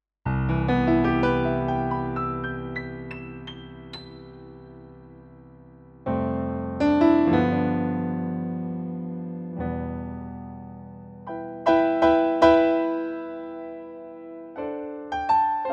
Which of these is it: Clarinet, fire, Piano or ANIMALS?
Piano